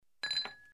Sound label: glass